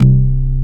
Korg CX3, C 1

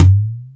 House Machine, E-MU Pro-Cussion

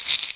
KL CHEKERE; Best Service Advanced Media Trax Modern Composer

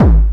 bhappycore, breakcore bassdrum drum hits

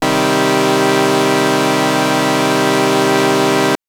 Synth Sync min c2; TrashLibrary

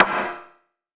rim, gated reverb; musicradar

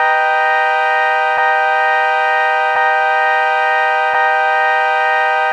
Nazz clouds, Pads; Sonic Foundry (Sony Creative Software); Synchro Funk

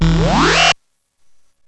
ELEC; East West - Global Sounds; SPATIAL

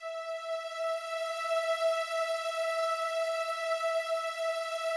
slow strings E4, Yamaha VP-1; Music Store; 90's Sample CDs

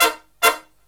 Brass Riffs; USB Soundscan vol.29; AKAI

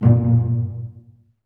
STR SEC, Orchestra; Roland - LA Composer vol. 1

